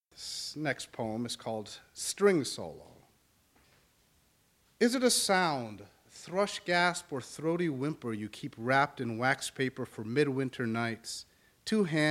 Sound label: String Solo live